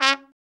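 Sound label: BRA TRUMP, trumpet, brass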